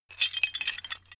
metal handling pieces

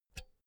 pull out cinch soft; objekte; elektronik

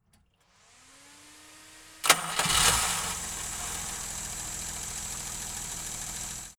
car jaguar e-type startup engine

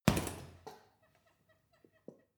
boxing punching bag hit wiggle; boxen

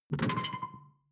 sci-fi alien console; maschinen; signale; soundpool

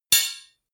knives large hit chink high; whooshes rises

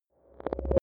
sci-fi flashback; swooshes-swishes

